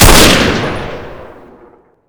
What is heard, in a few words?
mosin; garrysmod